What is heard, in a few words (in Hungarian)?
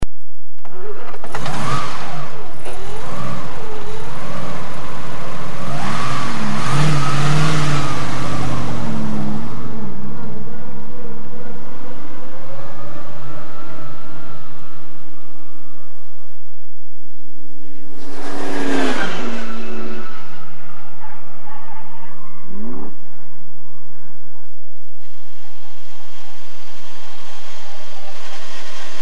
Alfa Romeo 8C 2300, motorhangok; autosport